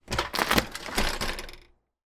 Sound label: household door open chain on restricted